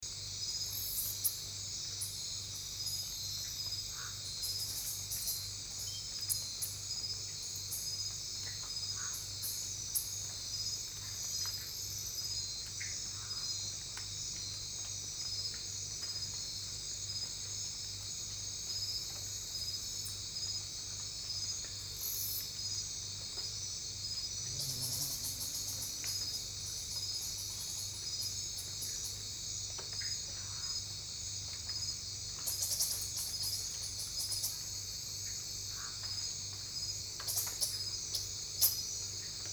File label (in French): chauves souris, Guyane